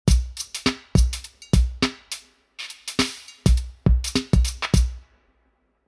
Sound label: drum, national park summer; koli; 4 way mono processed